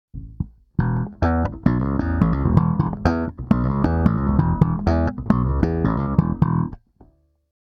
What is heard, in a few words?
Slap